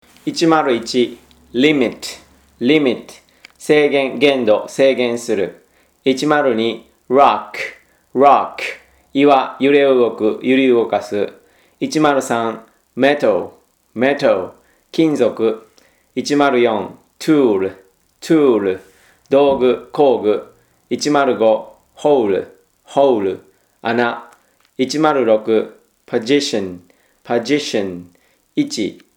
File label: tango